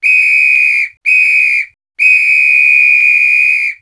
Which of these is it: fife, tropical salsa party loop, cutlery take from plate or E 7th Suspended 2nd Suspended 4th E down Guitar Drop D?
fife